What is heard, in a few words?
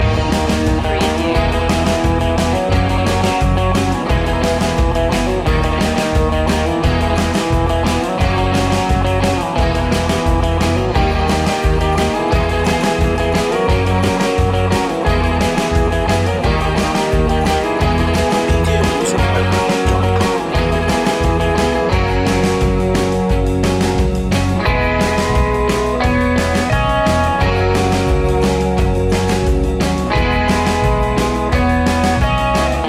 surfin loop